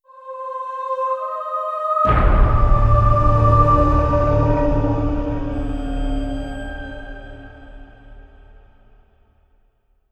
Round Start Short; Zombie Der Riese

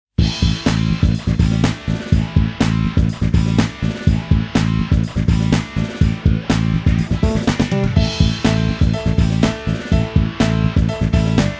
Goal Rush BGM

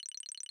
score stats; teardown; ps5 windows xbox series xs

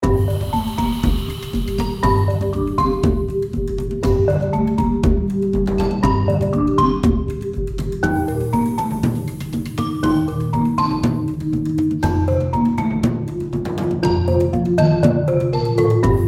BGM cave; gamerip; Witch Spring 3; Android, iOS

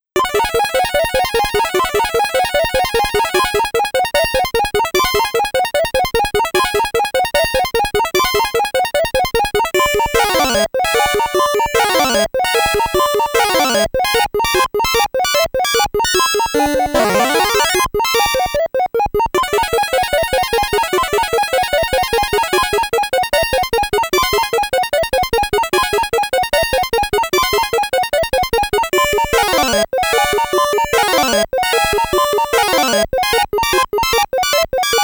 Dungeon; Ninja JaJaMaru Collection

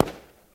pop

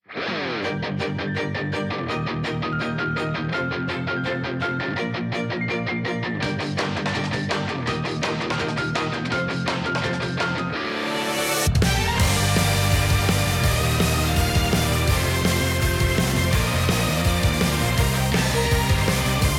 Music Vocal; the king of fighters for girls; android

